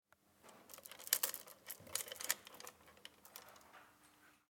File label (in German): Muttern